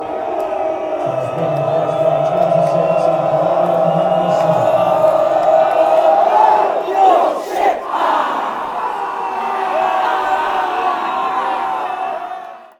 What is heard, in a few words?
goal kick, fanchants; torquay